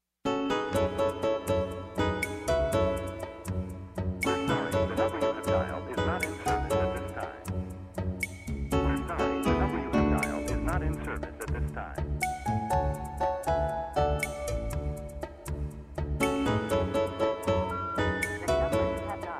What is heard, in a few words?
bgm ensemble